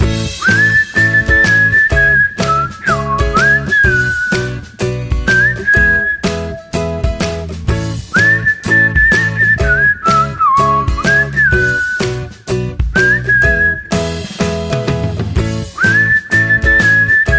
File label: Whistling